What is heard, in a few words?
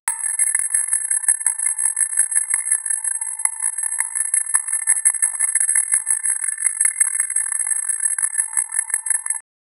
brilliant sound when success